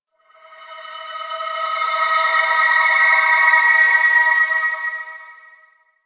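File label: Drifting Texture; Warframe; Ambience; Entrati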